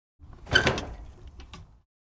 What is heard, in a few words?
Motorbike kickstand being placed; vehicle